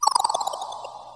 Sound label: Remove Disc; wii system menu